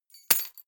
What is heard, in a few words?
key ring large drop parquet; objekte; schluessel